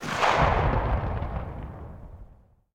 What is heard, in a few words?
gunshot echo; Blind Drive